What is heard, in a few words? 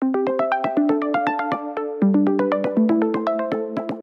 system ringtones; Amazon; Fire-Phone